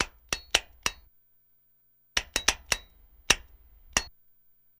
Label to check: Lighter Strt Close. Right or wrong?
Right